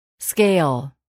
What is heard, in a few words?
scale